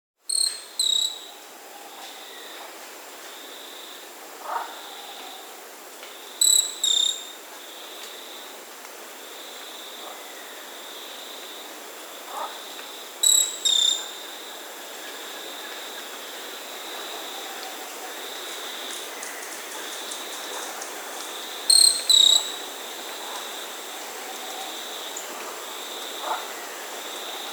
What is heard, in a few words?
Short-tailed Pygmy-Tyrant (Myiornis ecaudatus), Peru; S. America